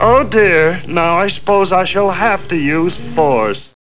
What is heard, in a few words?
death; Alien Arena; marvinmartian